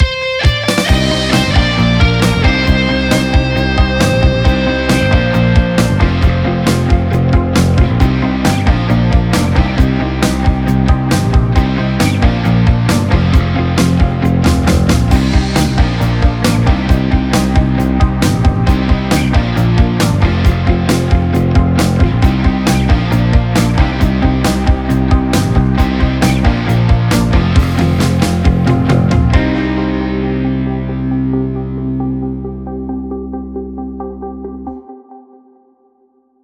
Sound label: gameover; nickelodeon all star brawl 2; ps4; xbox series xs